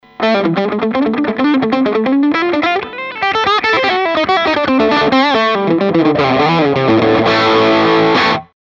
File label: tube; 电子管音箱参考录音; 一点点混响; Guitar Rig 3; 真正的电子管音箱参考录音